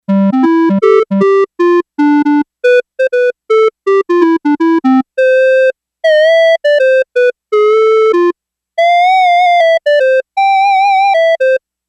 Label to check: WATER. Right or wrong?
Wrong